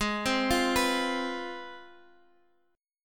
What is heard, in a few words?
C Major 7th Suspended 4th Sharp 5th, G sharp, chord